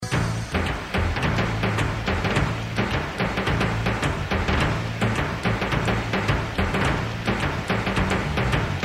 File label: Tupan Solo